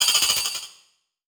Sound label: Multimedia Internet CD-Rom Flash Hi-Tech